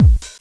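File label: DRUM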